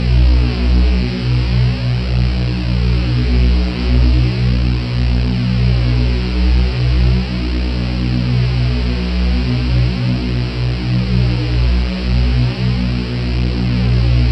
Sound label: Mod Reese Tube